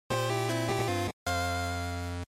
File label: GB RPG Victory